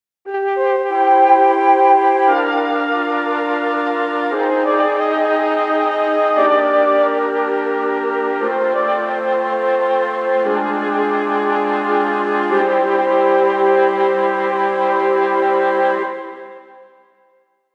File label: Mellotron Flutes; Classic Keys ROM Option